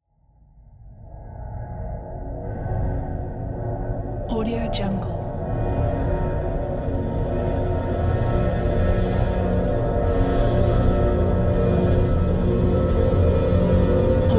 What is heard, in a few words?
unusual mysterious ambience